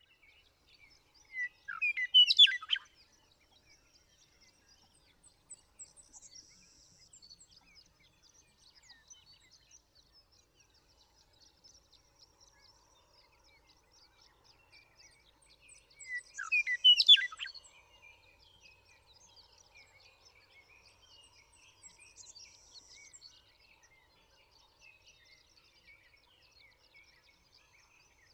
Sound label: Western Meadowlark; The joy of western meadowlark songs.